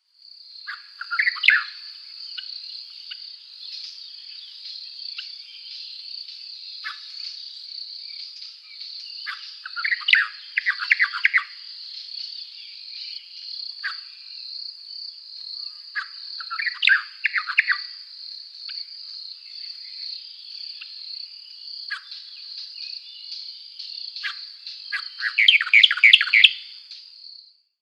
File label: Cape Verde Swamp-Warbler song